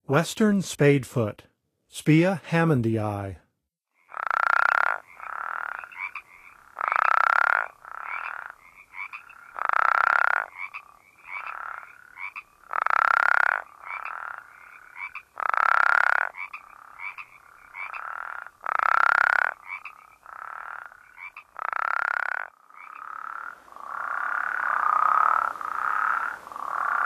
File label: Western Spadefoot; North America; Songs of Frogs & Toads of North America